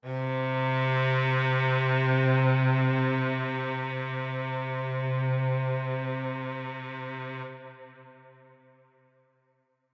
Cello Verb C2, Verby Solo Cello; PADS and STRINGS

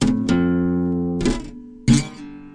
094 bendy weird guitar; GUITAR LOOPS

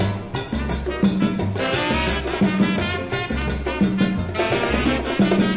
150 loopy latin, drum loops and breaks; 141 to 160 bpm

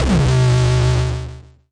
nasty stormfront; Electro FX; UNUSUAL KITS and FX

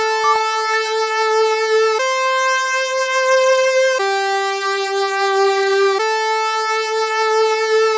big leady synth, synth and electronic loops

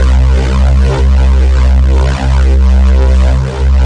big fat square bass; Basses

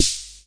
rhma sd, Whippany Rhythm Master; drum machines; 1970s

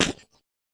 fx drum, Electro FX; UNUSUAL KITS and FX

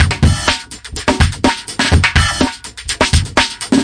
124 bpm funky phaser, drum loops and breaks

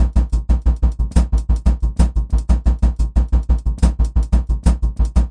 160 thudding stuttering, drum loops and breaks; 141 to 160 bpm

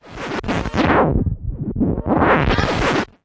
gross scratch, Scratching and Turntablism; drum loops and breaks; yer back

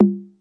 dissonant tom

